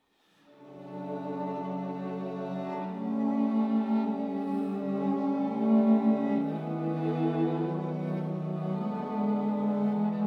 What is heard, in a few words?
String Quartet Adagio Soulful